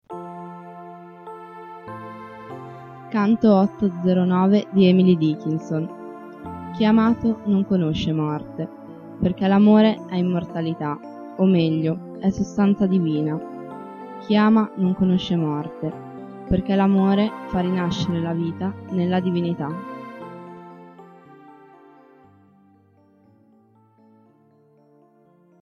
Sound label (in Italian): canto